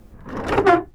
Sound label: Opening the wooden drawer; افکت صوتی